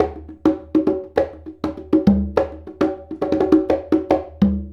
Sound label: Congas; Essential Percussion